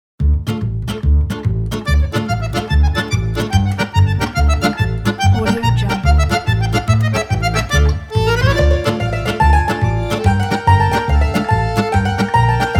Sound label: italian tarantella